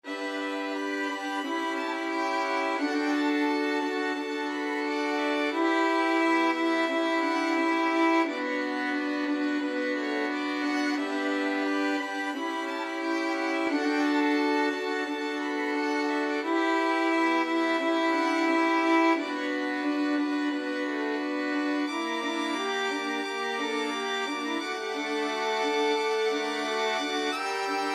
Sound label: violin ensemble